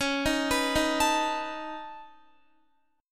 C sharp 7th Suspended 2nd Sharp 5th, C sharp, down, Guitar; arpeggiated; chord